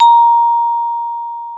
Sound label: LNG A#4, VIBRAPHONE; AKAI S-Series CD-ROM Sound Library VOL-7